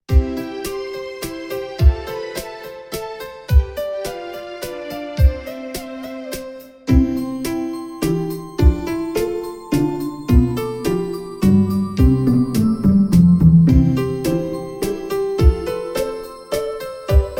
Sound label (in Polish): instr.